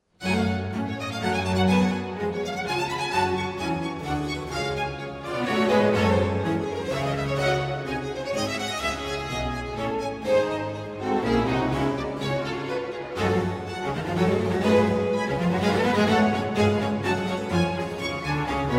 Cello Concerto in G Minor; III. Allegro non tanto

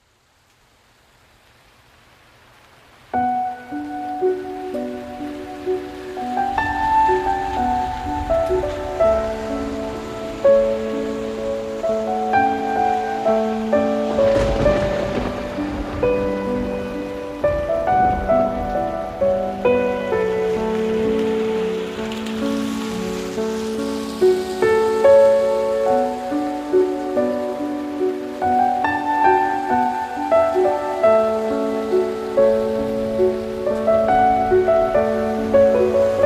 Piano and Rain

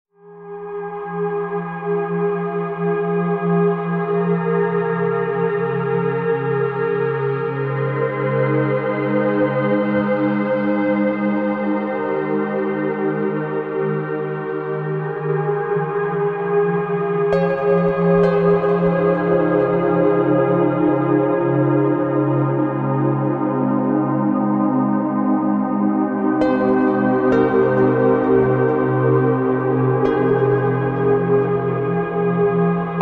432 Hz, Sleep Textures

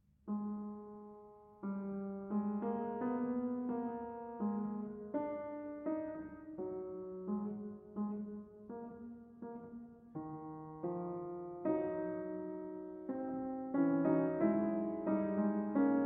Fugue in G-Sharp Minor; Prelude & Fugue in G-Sharp Minor, BWV 863

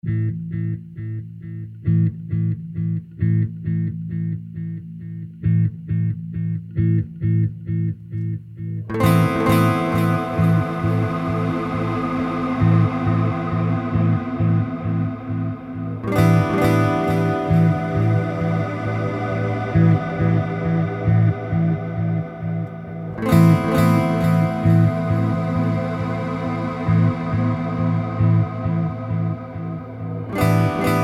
Acoustic

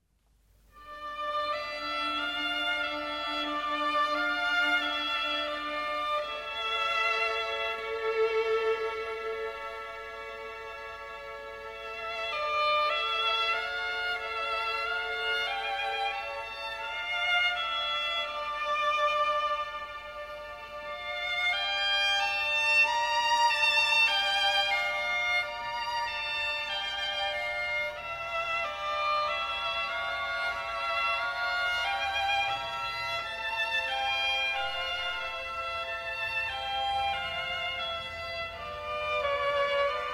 Violin Concerto in A Minor

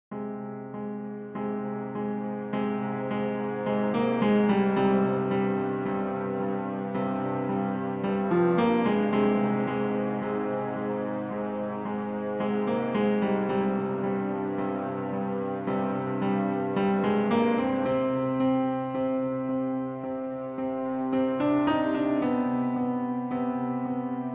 Ominous Forest

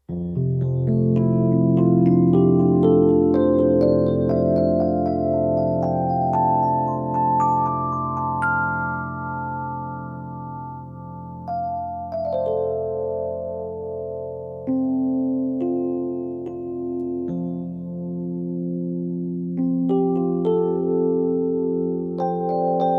4th Chakra Keynote F; Green

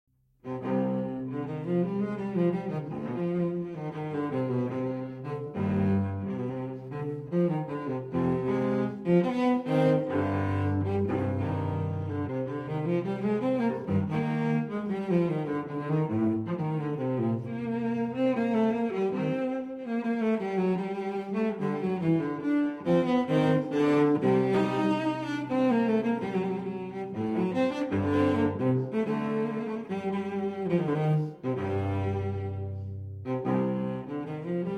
Cello Suite in C Major Courante; Cello Suite No. 3 in C Major, BWV 1009; III. Courante

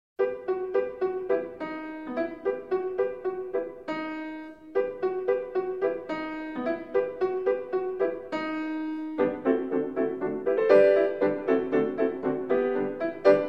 Pieces For Piano, Humoresque in G Major

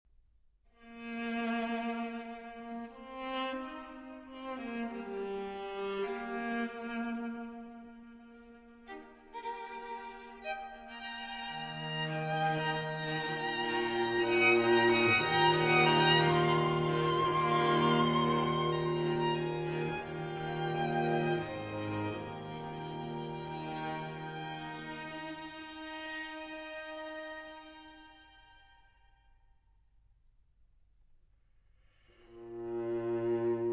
String Quintet in E Flat Major, Allegro Non Tanto

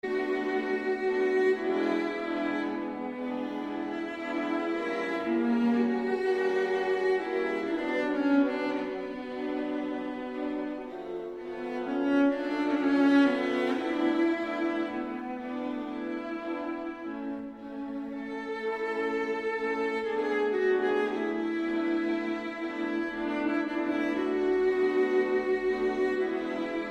String Quartet in D Major Notturno Andante

